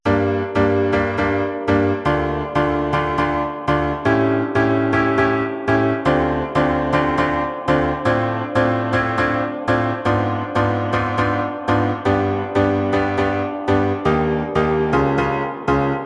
Piano Chords

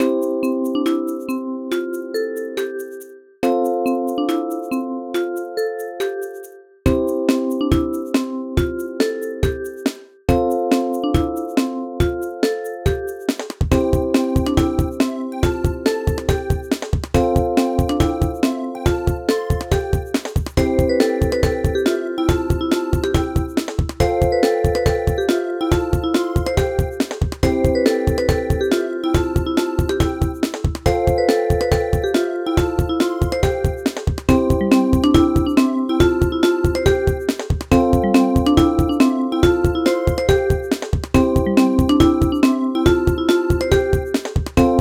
Peaceful music with a sense of curiosity; Children's Game Music 4 - Activity; synth woodblocks; Created for an educational game for children.

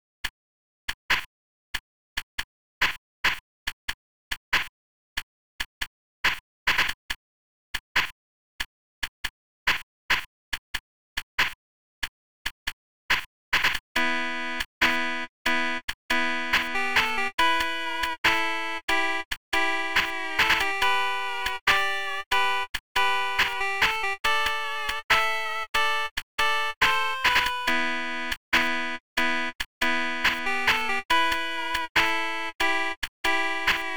Credits

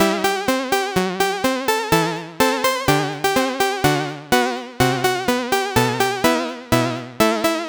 Epics 8Bit

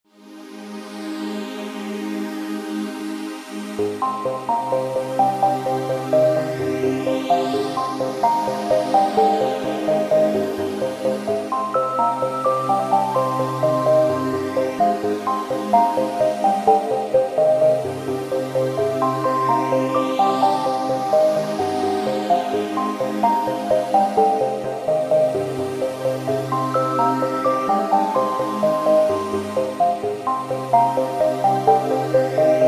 space drone pad

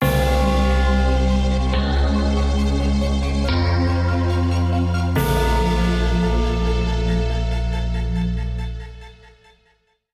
Defeated (Game Over Tune)

